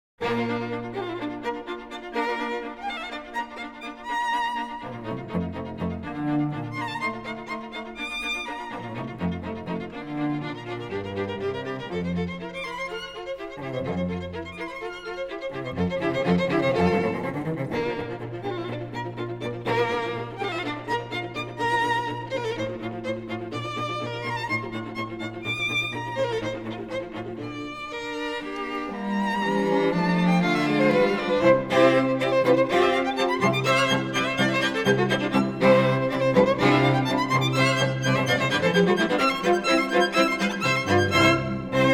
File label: String Quartet in B flat; Beethoven